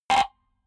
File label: button, signale; soundpool